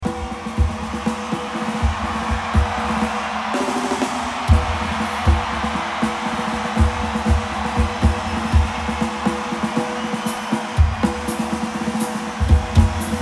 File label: Drum Solo